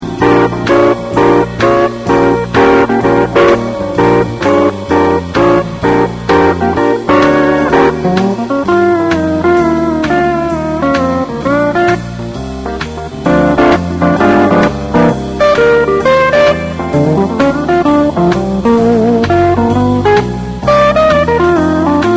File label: Blues To Use Solo